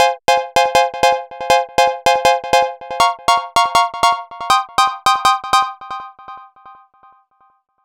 happy chord, 160 bpm; vinnies

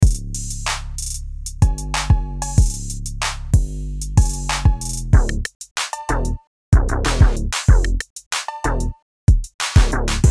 darker digital kickboxer

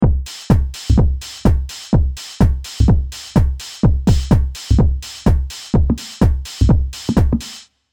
serum drums; chiqui audio

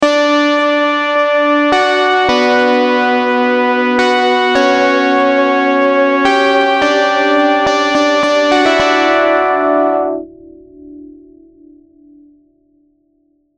serum synths; chiqui audio

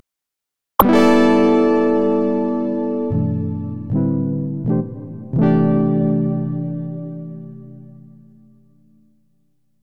downstroke

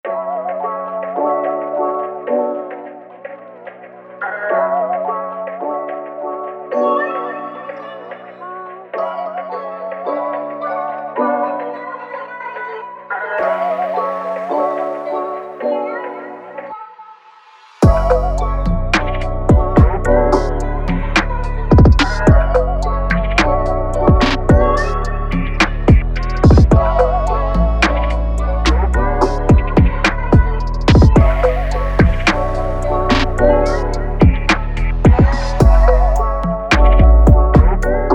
moog shots; cartel loops

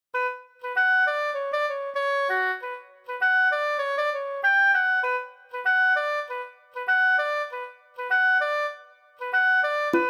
oboe; crows nest

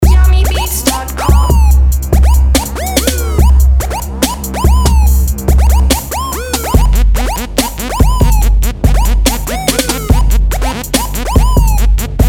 trap pack

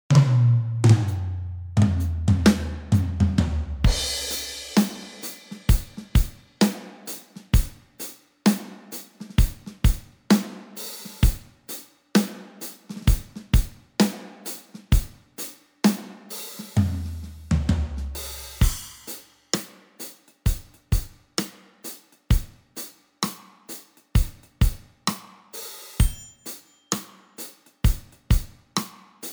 drums power rock; handy drums

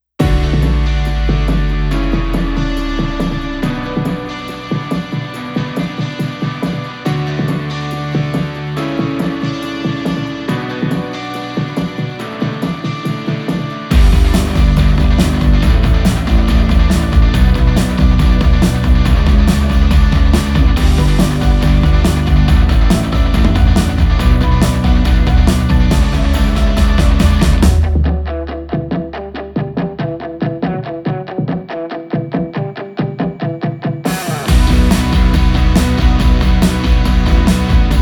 virtual guitarist sparkle

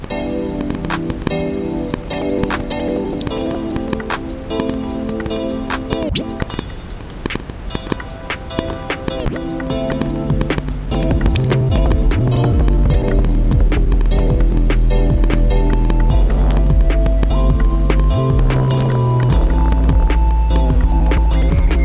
broken chill

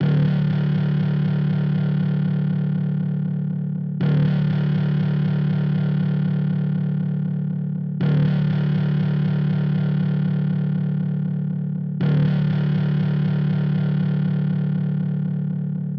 bass loop d 120 bpm

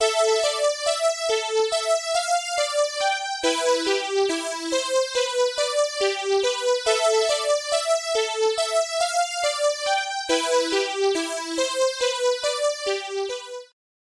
lead helium atom

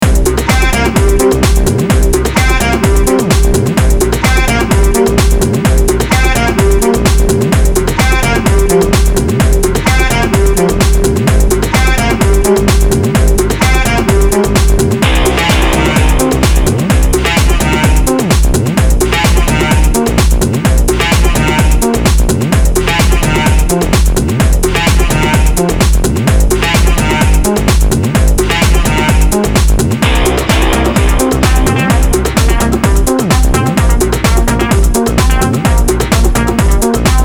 sax; Inspire Audio; The Mad Sax

